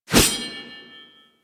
Sword Swipe, Melee Whooshes; Warframe; Weapons

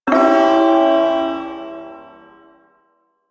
Ersatz Shawzin Phrygian Chord; Warframe; Duviri Ersatz Shawzin

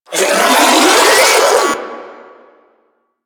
Spin Scream; Enemies; Abilities

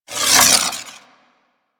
Proj Whiz; Enemies; Sentient Dolicholyst

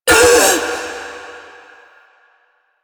Ring Fail; Warframe; Jade Shadows; Quests; Birth Minigame

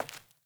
Sandals Walk Default; Characters; Player

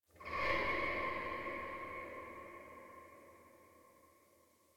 Metal Groan, Ambience, Locker Room; Warframe

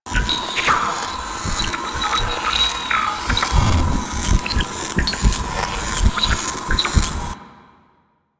Sentient Scan Loop; Warframe; DE; Sentient Scanning

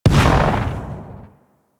Shotgun Alt Proj Mini Explosion; Weapons; Revenant; Warframe; Tenno

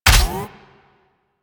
Arch Burst Gun Fully Charged, Weapons; DE; Warframe; Archwing

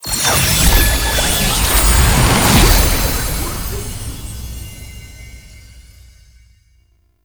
Stinger Exit; Warframe; Nokko Colony; Visions

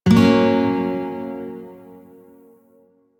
Shawzin Hirajoshi Chord J, Music; DE; Warframe

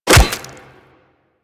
Melee Staff Impact Flesh; Warframe; Weapons; Staffs

